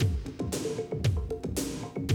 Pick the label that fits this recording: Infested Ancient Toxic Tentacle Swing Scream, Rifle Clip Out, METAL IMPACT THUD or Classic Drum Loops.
Classic Drum Loops